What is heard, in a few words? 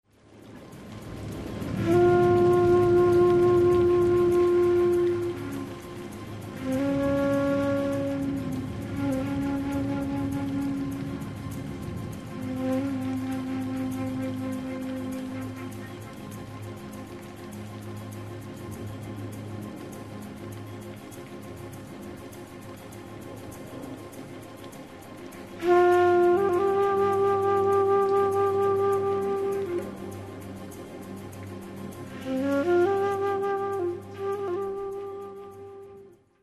mono, Silent Flute